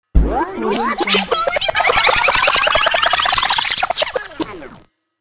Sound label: rewind